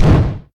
wumph

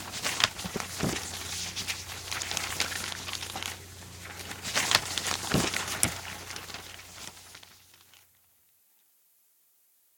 paper ruffling

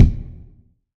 wumph

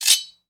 slide

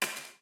drop glasses

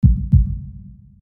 heart single fast

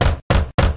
hammer

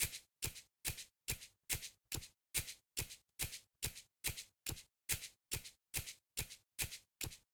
emi running

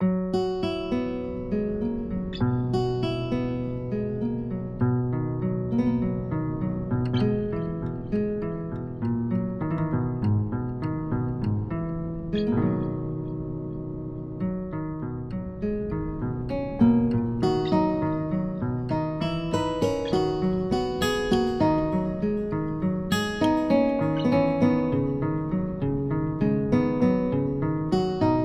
Air Guitar; bgm; Katawa Shoujo; katawa-shoujo-re-engineered

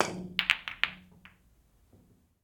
billiards break

